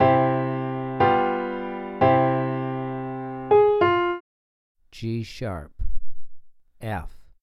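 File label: 2 Note Melodic Major g#3 f3, piano; ear training; melodic ear training for musicians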